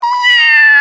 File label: meow